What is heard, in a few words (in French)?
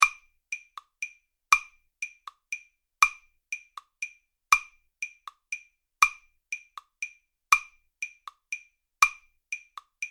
rythmes 1 et 2 ensemble